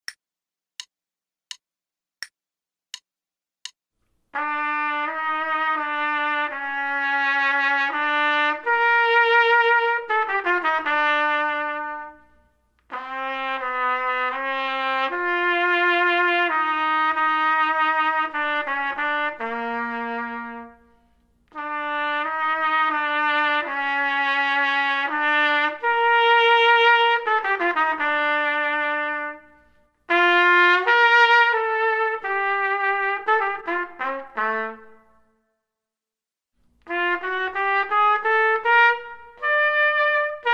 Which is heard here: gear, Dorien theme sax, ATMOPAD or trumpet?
trumpet